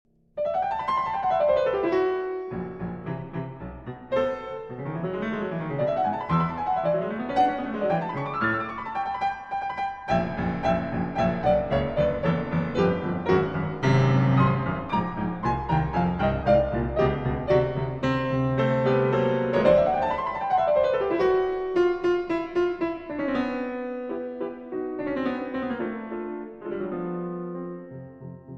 Piano Sonata in C Major Allegro strepitoso

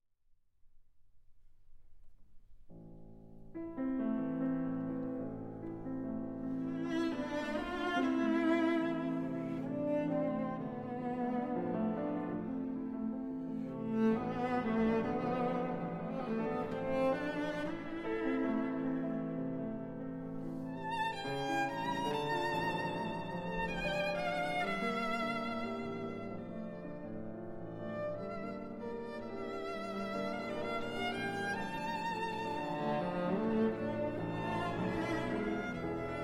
Piano Trio in A Minor; Tchaikovsky; Op. 50, TH.117; I. Pezzo

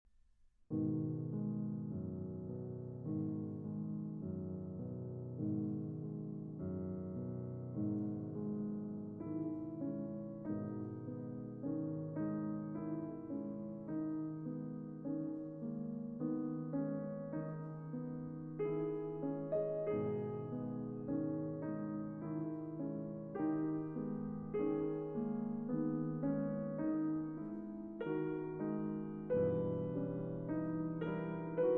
Piano Sonata in D minor - Andante; Op.14 - III.

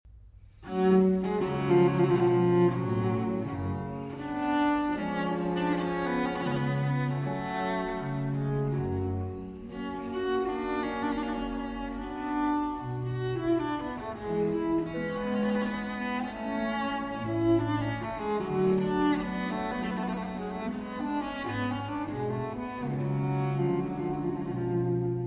Cello Sonata in G Minor, Preludio; Largo; Vivaldi

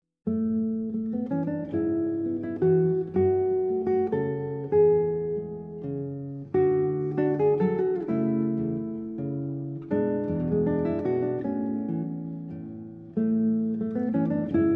Valses Poeticos, Melodico; 8 Valses Poeticos; No. 1; Arr. Raphaël Feuillâtre

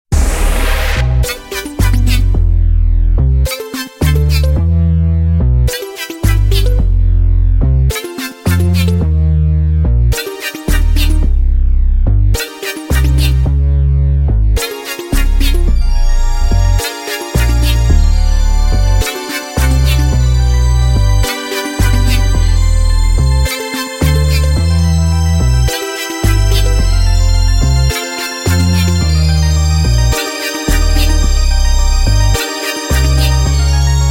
BPM 108

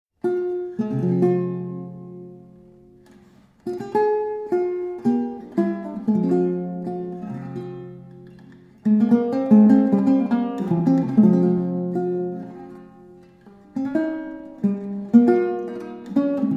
Suite in G Minor I. Allemande; en g.ré.sol ut tierce mineure

